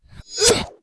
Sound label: swordman attack; megaglest